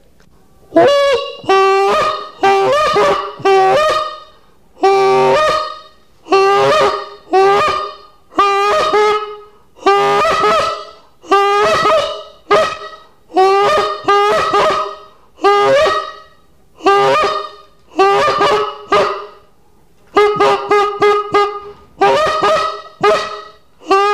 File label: goose, Duck Calls